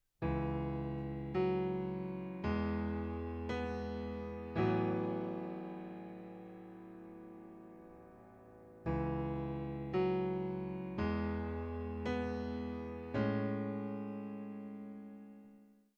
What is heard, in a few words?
Piano Sample